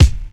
bright kick single shot d key

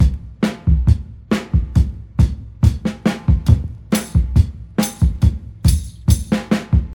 69 bpm drum beat c sharp key

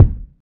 short steel kick drum sound C key

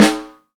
snare sound D# key; snare drum samples

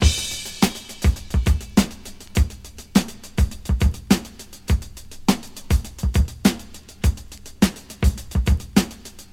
103 bpm high quality drum loop sample d sharp key

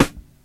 strong bottom end steel snare drum c sharp key

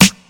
studio snare sound f sharp key

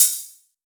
small reverb open high hat sample a sharp key

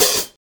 dry open hi hat g sharp key; open hat samples

